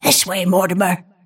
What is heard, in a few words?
move pain; snapfire